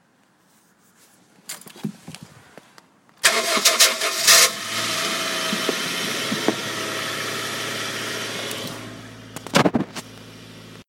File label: starter going fit noise; 1st generation gd 01-08